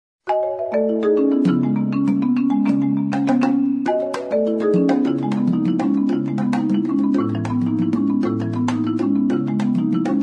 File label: Rhythm Song